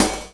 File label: Dose HiHat; Sonic Foundry (Sony Creative Software); Drums & Drones; Construction Kits